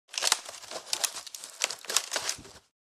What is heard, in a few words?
Firewood kindling movement; wooden